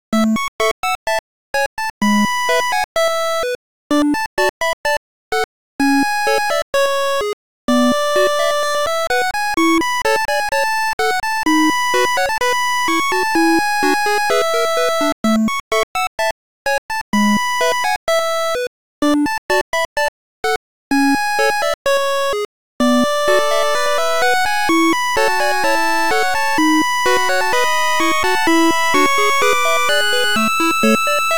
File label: 8bit Loop